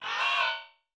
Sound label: Cry